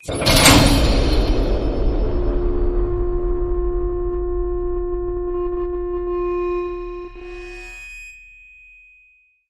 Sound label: Hit Gate Closed